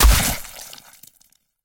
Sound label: Knife Player; Game rip; MiSide